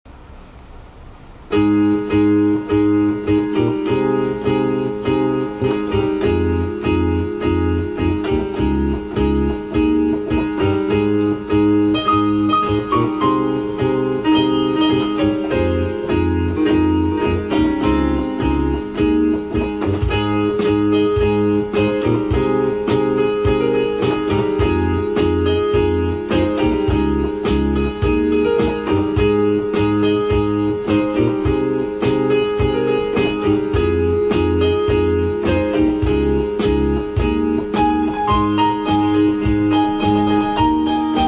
piano instrumental version

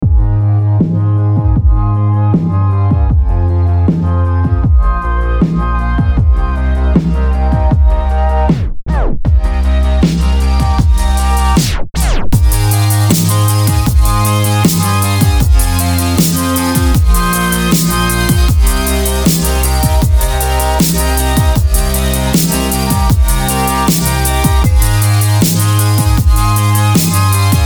retro chillwave

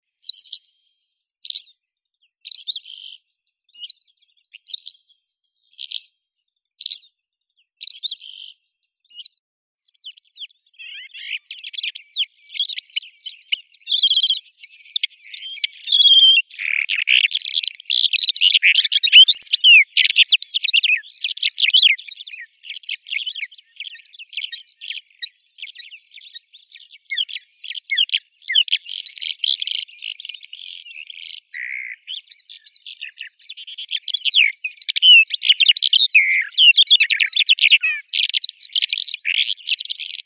zhavoronok stepnoi melanocorypha calandra; zvuki prirodi

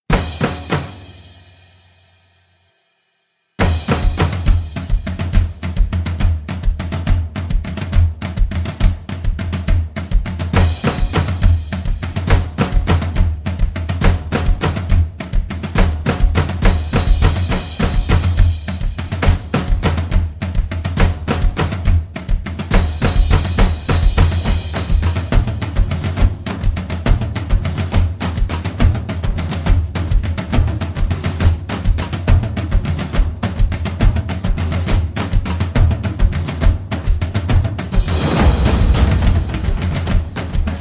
Rwandan ritual drum set only

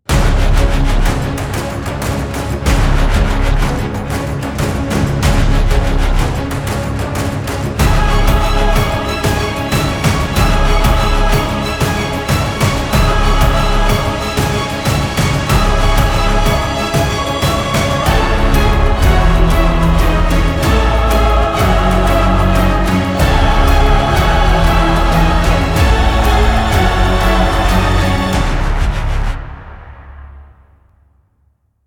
rapid alarm

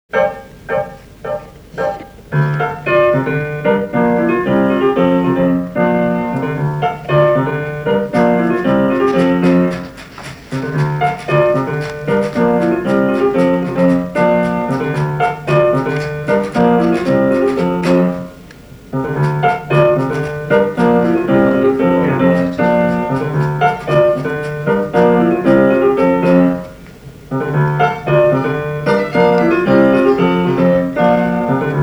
riff

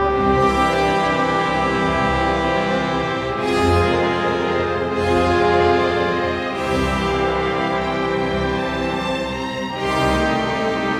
concerto for trombone and orchestra

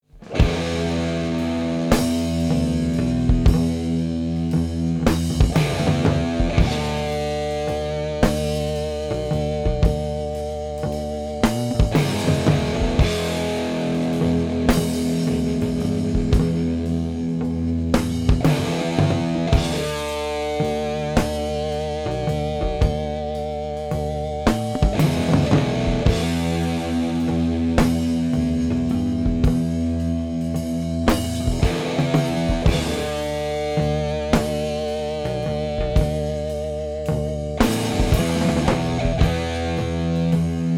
instrumental and no siren

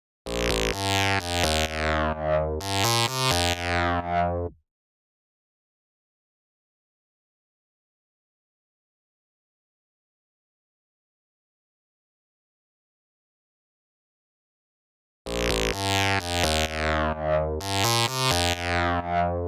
blast wave end bass lead

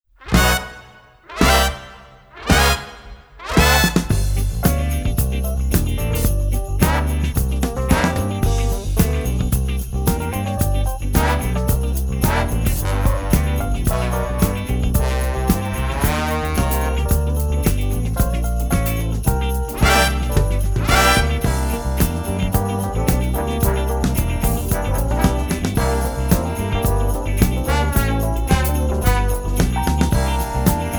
instrumental version

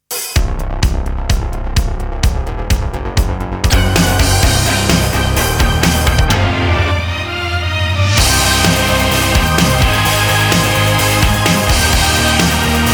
instrumental

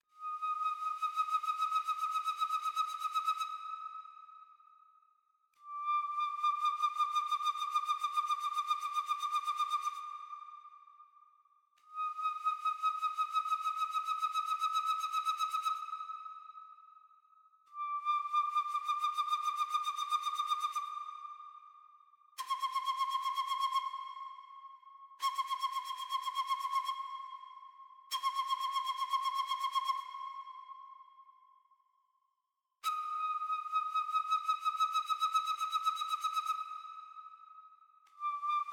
native american flute

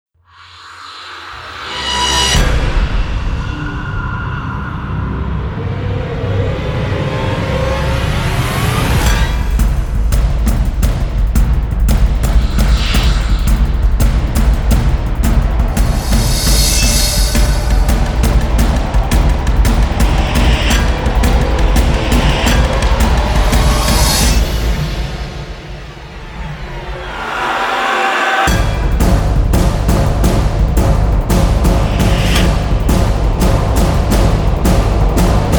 drums fx

